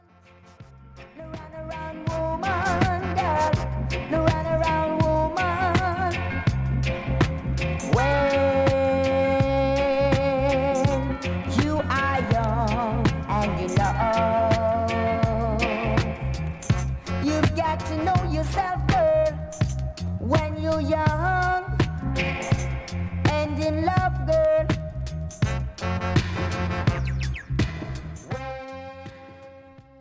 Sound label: Drum & Bass